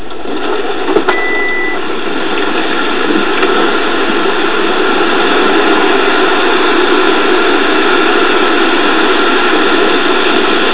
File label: you can hear the seatbelt signal when the door opens; 1996